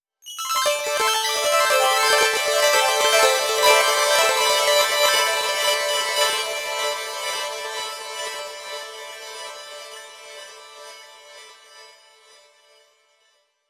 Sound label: instrument layer; lead arp chord melody